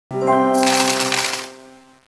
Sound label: Roulette Won; cstrike